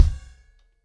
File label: 110BPM, acoustic drums, drumloops